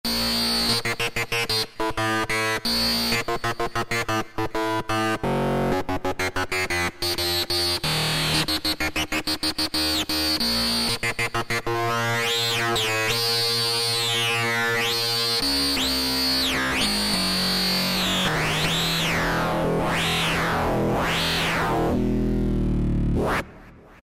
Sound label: Ba Hardsequence, Yamaha EX5R; Factory presets